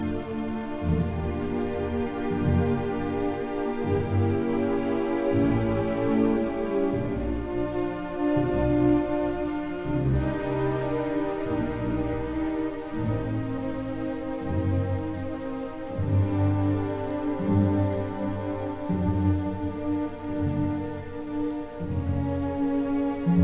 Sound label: Symphony in B flat major Introduction Adagio Allegro; No 5; WAB 105